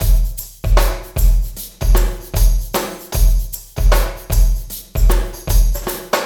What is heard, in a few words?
LOOPS